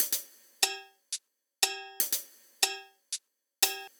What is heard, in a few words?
Beat Perc Mix, 120bpm, Old Skool House; House of Amnesia; Big Fish Audio; OSH Construction Kits